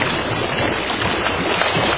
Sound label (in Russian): techenie shumnii blizkii priglushennii